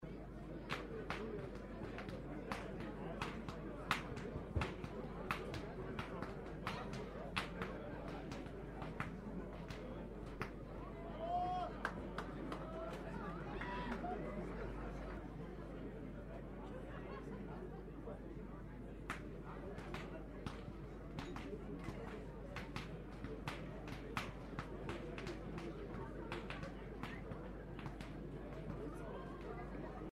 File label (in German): schnalzen, jugend; waging